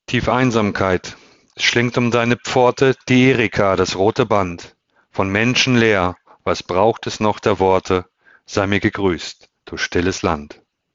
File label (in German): stimmtraining vokale klingen lassen; die macht der stimme; kommunikation